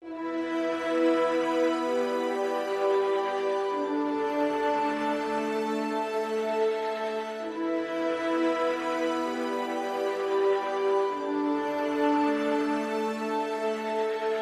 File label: Organ Synths Strings; 30sec